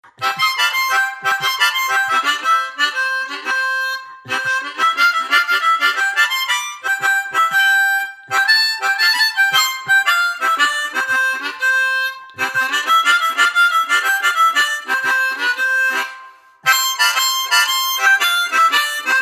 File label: Harmonica Processed